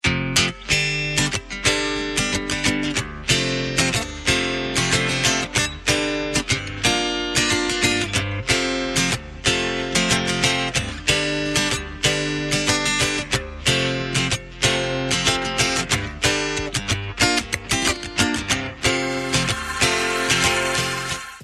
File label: Guitar Ringtone